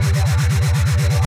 charge short; CrossCode; battle

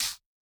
hit light; CrossCode; battle

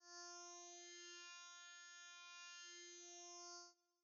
pumpkin; halloween

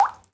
drip, water; World Of Goo

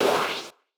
sweep

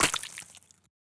eggpot destructible

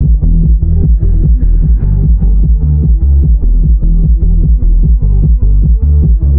EUROBEAT outside

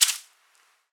swapper shot; The Swapper

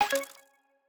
item toggle off

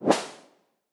sweep damped; CrossCode; battle

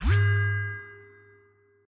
door activate

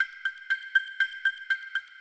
rank timeout; CrossCode; battle

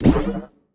wave charge

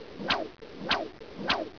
SLING; Caesar 2; DOS

